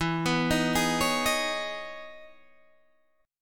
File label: E Major 13th down Guitar DADGAD